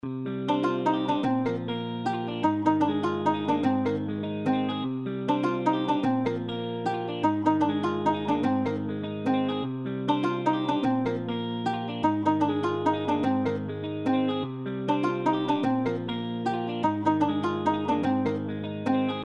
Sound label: Afro Melody Loop Cmin 100 BPM Guitar